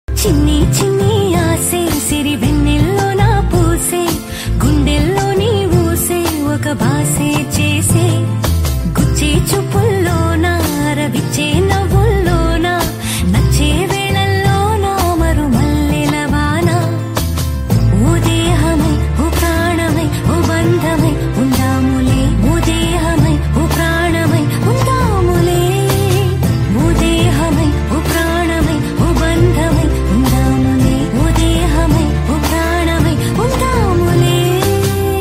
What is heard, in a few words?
telugu love songs